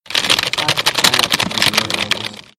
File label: Signpost FX; DoughnutQuest